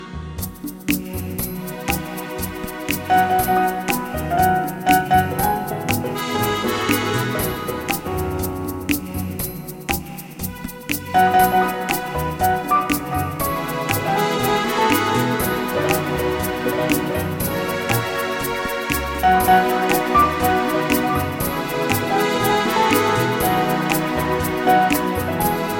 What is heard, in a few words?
Great Reggae Dancing